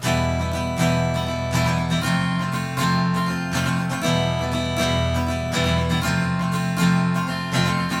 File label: phrygian spanish